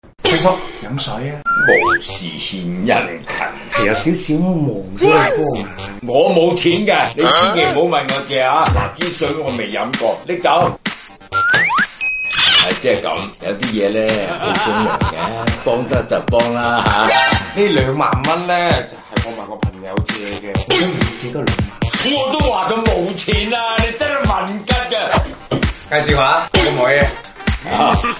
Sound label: laugh; breadradio